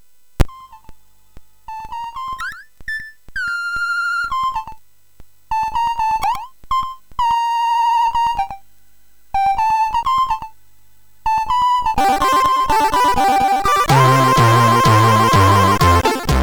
sid MOS6581R2; soasc

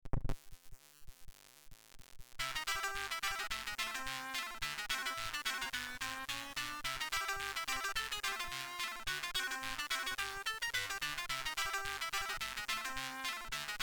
String; sid

